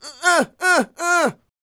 A YELL, MALE HUMAN; ILIO; Vocal Planet

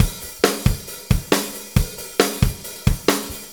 Drum Tools, Loops; Sonic Foundry (Sony Creative Software); Various Loops

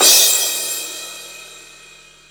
CYMBALS, Disco House; USB Soundscan vol.20 - Fresh Disco House I

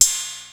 TR, drums perc, Roland; Rubber Chicken Software Sample Bank

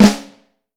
FLAM, Snare Drum; Q Up Arts; Johnny.C's Vintage Snare Drum; 5X14 LUD

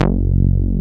SLAM F#1; Best Service Alpha Dance vol.2; SLAM SEQ.1

